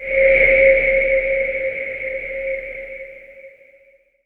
noise fx; Masterbits; Switch FX

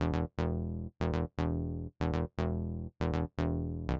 Bass Riff E-C, 120bpm, House; Construction Kits; Big Fish Audio; Old Skool House; OSH House of Amnesia

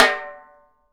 MAPLE SNARE

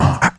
VOX2 R, Roots Disco; USB Soundscan vol.32; AKAI

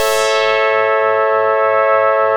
BOLERO DR, Synth and Organ

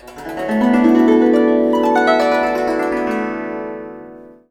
GUZHENPH, INSTANT ASIA; Spectrasonics; Heart of Asia

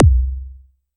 Tempest 808 Style Kick

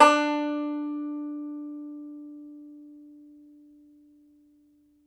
Tenor Banjo

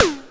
PERC FX, SYNTH PER

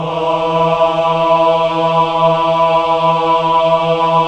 CHO AH, Choir of Ahs; Roland - LA Composer vol. 1

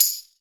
FREE TAMB; Univers Sons; Basicussions

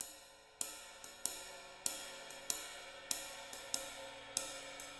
SWING, living drums; Sampleheads; Peter Erskine

